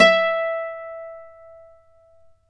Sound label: Celtic Harp, Plucked Strings